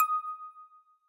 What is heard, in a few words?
note, tambourine